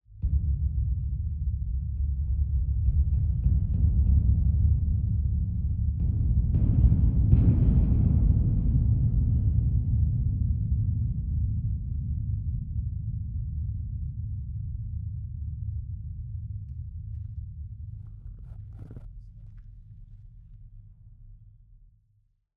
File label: metal plate thunder; sonniss gdc